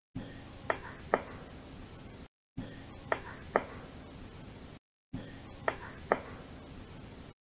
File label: rock knocks